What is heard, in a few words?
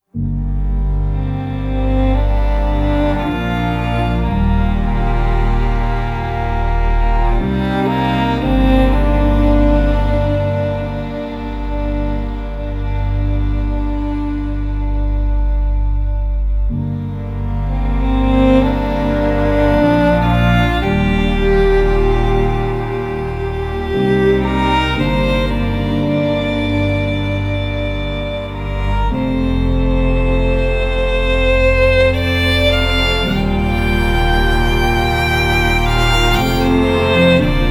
Stradivari Violin sampled violin; Komplete